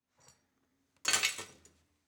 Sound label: sweeping glass into metal dustpan; collanderson